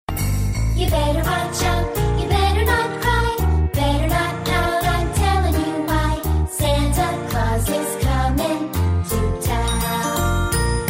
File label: awesome santa song